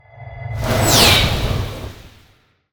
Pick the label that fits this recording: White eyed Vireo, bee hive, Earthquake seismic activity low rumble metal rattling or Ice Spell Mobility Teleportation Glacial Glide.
Ice Spell Mobility Teleportation Glacial Glide